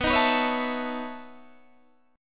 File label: B 11th B Guitar DADGAD, chord